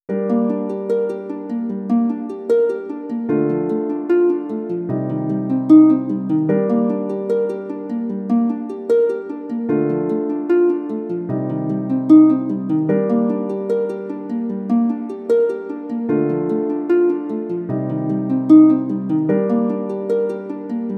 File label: dramatic no violin; by burak sound bank; dramatic dram vol 15